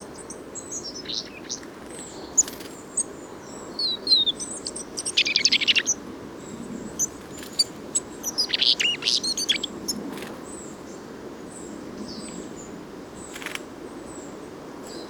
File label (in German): Rotkehlchen; Muenchen M; Michle Peron